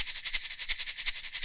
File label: Shaker Loop